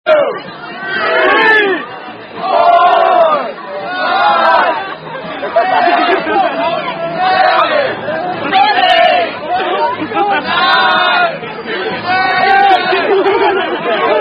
Dizzy Stick, Tailgating, Drinking Game With Beer Bong Afterwards; Iowa